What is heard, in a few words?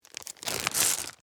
Bag Marbles Open, Foley, Plastic; Miscellaneous Toy; BlastWave